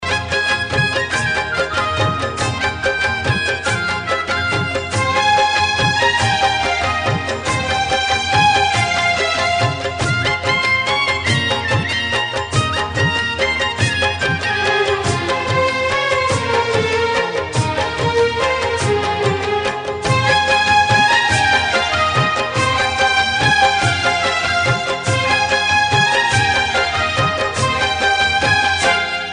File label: music